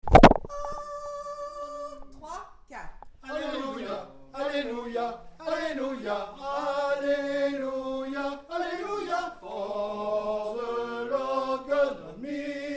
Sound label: tenor